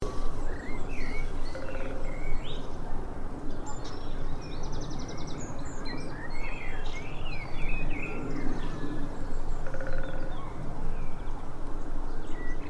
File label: greater spotted woodpecker; walthamstow marshes